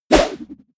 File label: whoosh throw